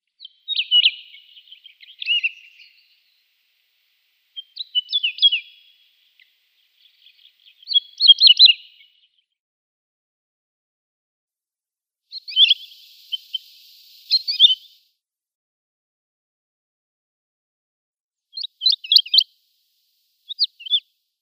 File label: Wood Lark calls